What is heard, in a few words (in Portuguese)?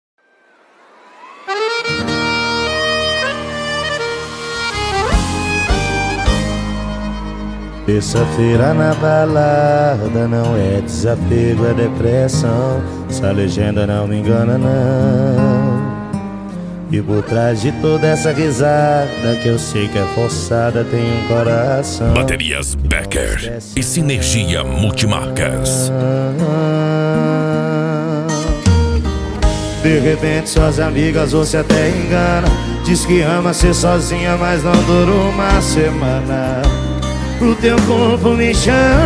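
especial sertanejo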